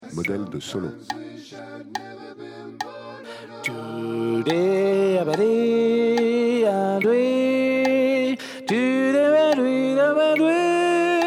Solo Alto ou Tenor

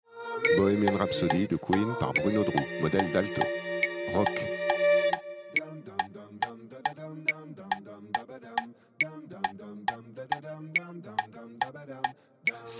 Alto, Rock

